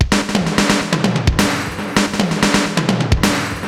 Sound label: Space Echo HR-16B 130BPM